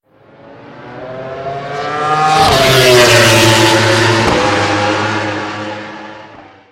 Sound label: yamaha motif